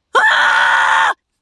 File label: Burst scream